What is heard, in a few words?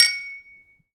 Cups Loud